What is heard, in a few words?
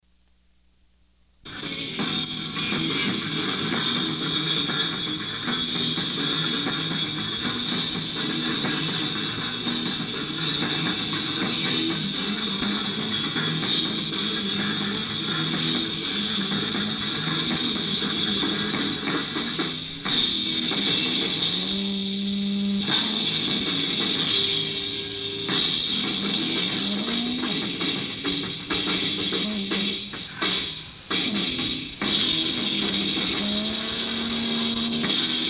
Screamery Intro